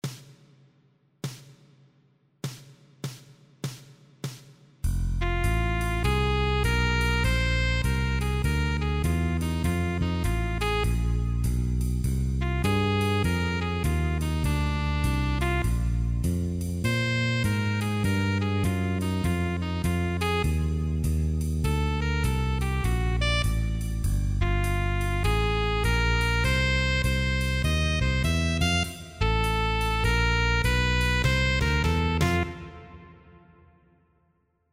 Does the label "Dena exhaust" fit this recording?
No